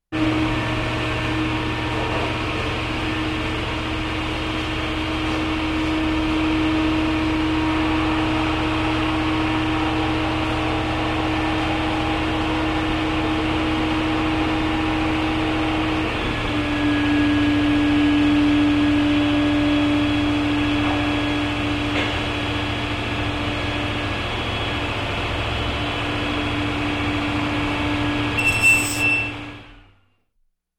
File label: Machine running hum